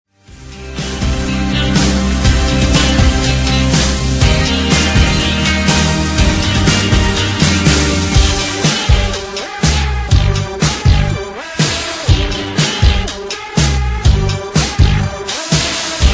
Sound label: karaoke version instrumental